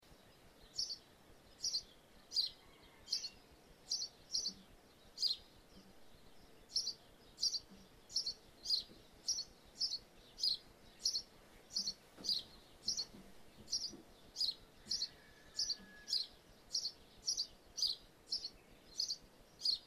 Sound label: ambience birds in roof space feeding stereo